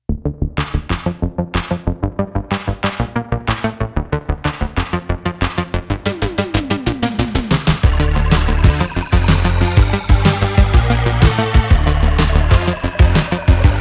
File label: plastic pop 80s analog